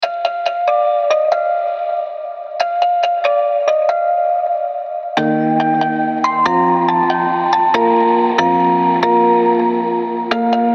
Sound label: music box